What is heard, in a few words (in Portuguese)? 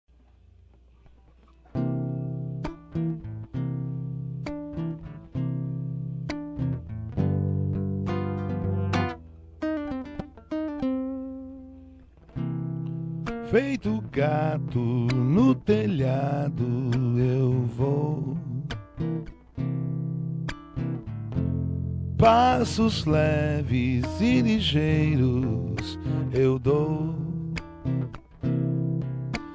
viola